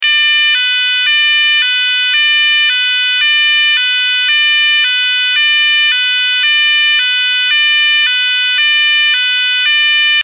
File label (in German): Ton